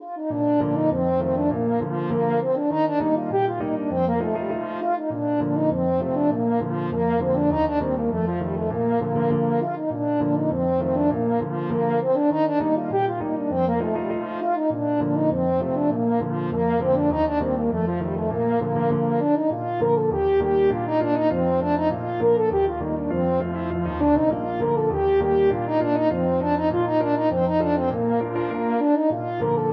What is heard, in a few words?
galopede, french horn